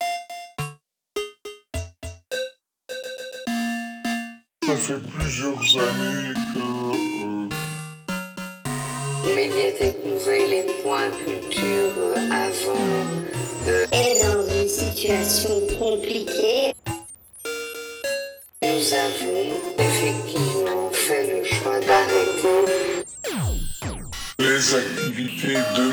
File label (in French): jingle boule de billard, jingle radio